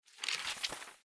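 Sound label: sound of paper transition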